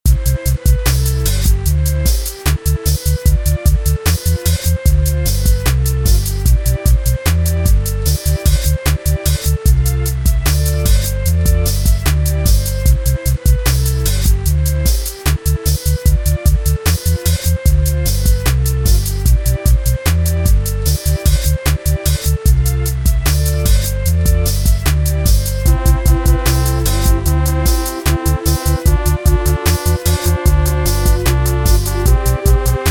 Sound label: retro 60s americana beat with horns 75 bpm; train